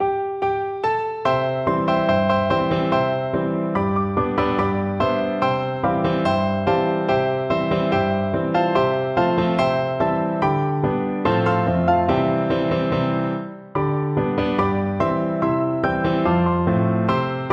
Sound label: to the river piano duet